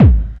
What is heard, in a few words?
Kicks Loud